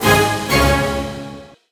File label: Check Point